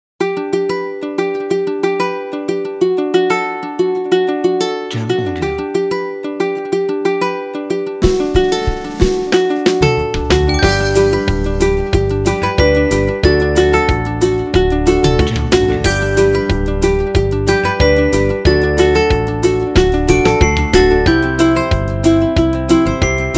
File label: Upbeat Positive Kids